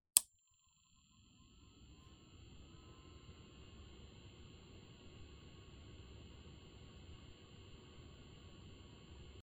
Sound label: old LED fan sound